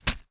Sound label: foley money in envelope drop